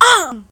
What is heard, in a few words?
female death; ufoai; soldiers